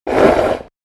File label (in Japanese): suu